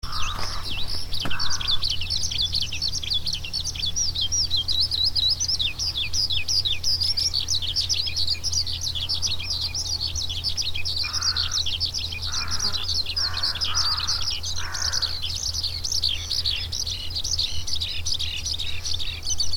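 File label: skylark; hibari; kigoyama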